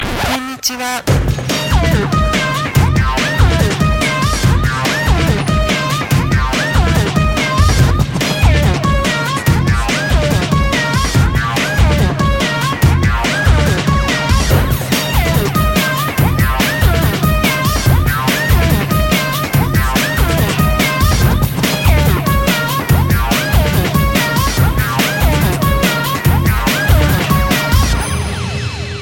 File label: Asian Break Jingle